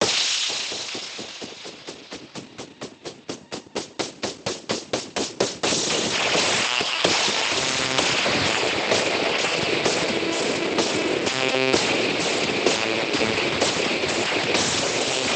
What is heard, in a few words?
generic rock; speex